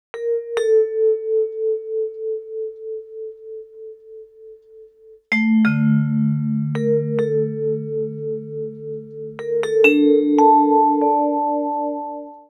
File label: Vibes, Cinematic Funk Urban Tone Poems; Sonic Foundry (Sony Creative Software); Session 05 - The Triad